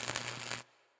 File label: betline Bolt; netent; wishmasteroct